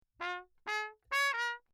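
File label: trumpet mute Lechner phrase; CrownAlu; Perinétventiltrompete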